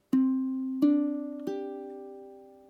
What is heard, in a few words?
chord cdg, ukulele